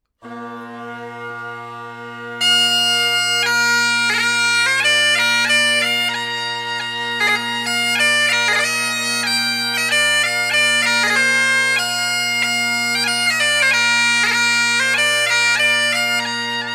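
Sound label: scotland the brave, Bagpipe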